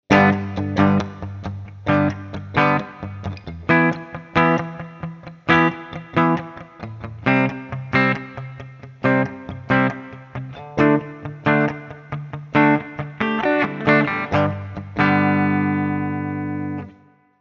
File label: palm mute clean